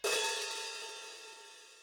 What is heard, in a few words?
hi-hat, Millenium MPS-400